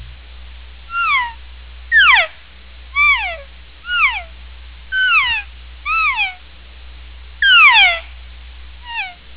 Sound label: Elk Beacon